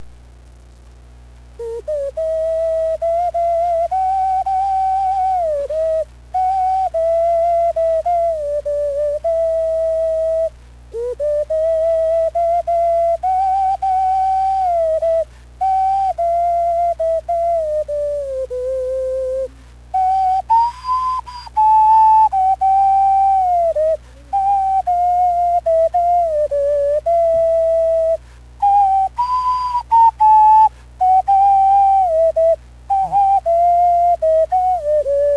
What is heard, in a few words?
toilet